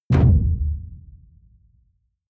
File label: sci-fi underwater impact; hits impacts